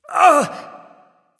grunt hit; smite; voicepack; guanyu

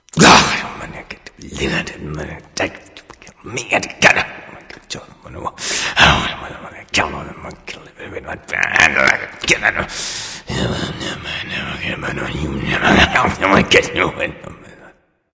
grumbling; smite; poseidon

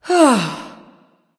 nv sigh; smite; awilix

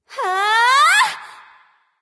battle cry; smite; voicepack

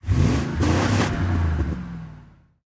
boar idle; smite; god artemis

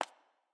shoe tap; Smite; Baron Samedi

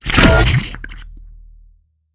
portal drop; smite; god cthulhu

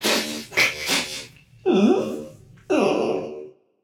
boohoo, voicepack; smite; jorm

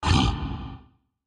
lobby ox grunt; smite; gilgamesh

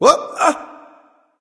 nv breath; smite; voicepack; xbalanque

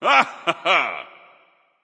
heh heh; smite; ra

